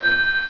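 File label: Item Put Stone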